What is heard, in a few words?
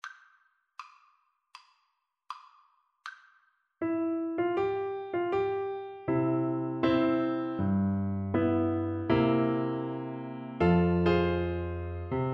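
recorder karaoke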